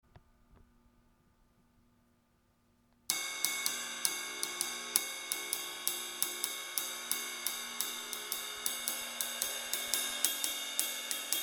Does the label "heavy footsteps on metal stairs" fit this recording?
No